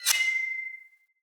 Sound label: Short Sword Warning; Weapons; Enemy; Swords; SinecureShortSword